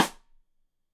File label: Side Stick Stereo; Deeply Sampled Drums